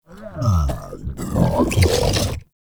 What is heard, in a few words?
Infested Shotgun Idle One Off; Weapons